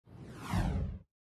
Wipe SFX; Sitting Duck Music; Good Morning Britain

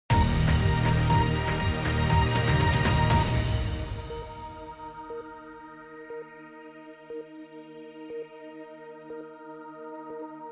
Quarter Hour Sting; BBC News

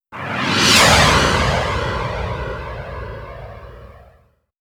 Wipe SFX; Good Morning Britain

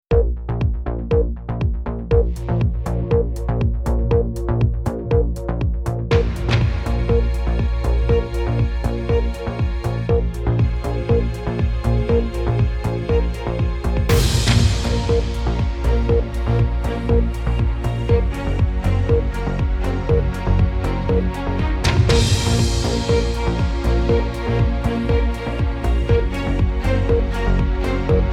BBC News Channel 60 Seconds Countdown

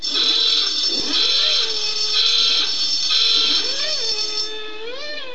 qik laxon

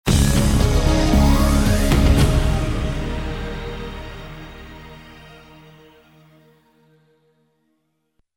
BBC Four News Stab

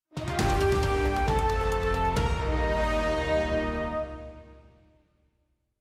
Sting; Sitting Duck Music; Good Morning Britain

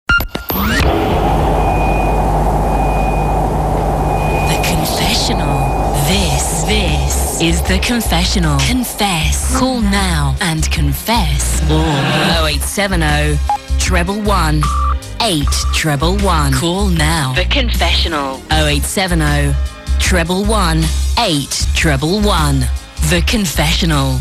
Jingle; Jezzas Confessions

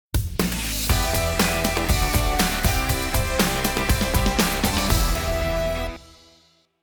Buzz Sting; Sitting Duck Music; Good Morning Britain

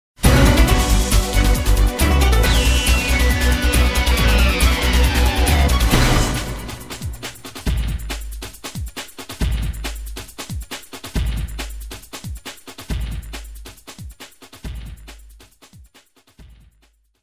Sting; Newsround

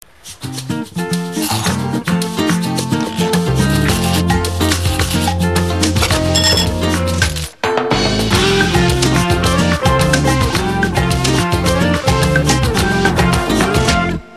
Cocktail Ident Long

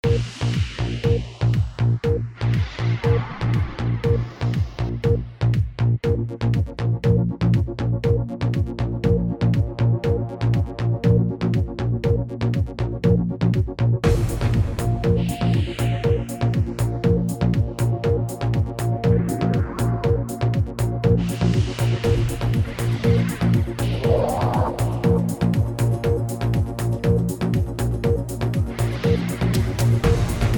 Countdown (with SFX)

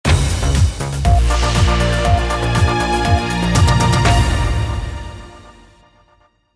BBC English Regions Long Sting

